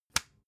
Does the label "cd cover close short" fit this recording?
Yes